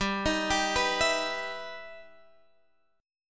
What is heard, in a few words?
E Minor Major 7th G